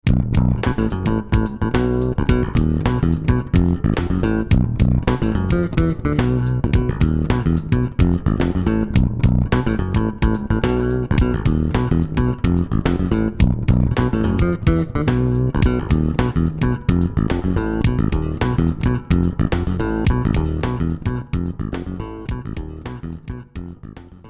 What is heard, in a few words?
Octa funk